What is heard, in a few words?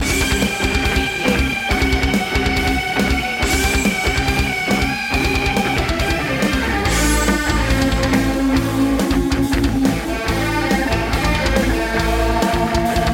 bmx bike loop